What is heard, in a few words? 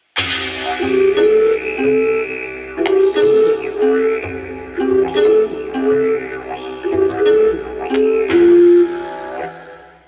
Music